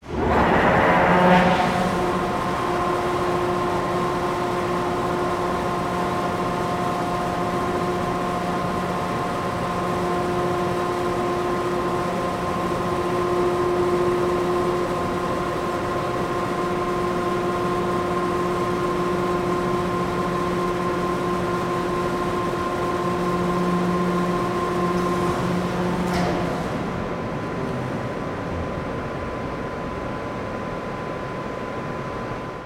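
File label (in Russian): губернатор насоса пускает звук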